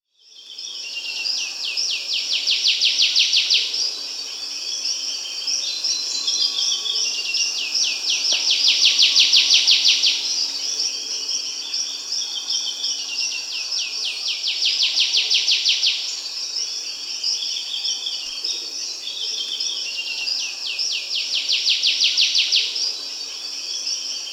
Buff-rumped Warbler (Basileuterus fulvicauda), Peru, Birds of the Amazon; S. America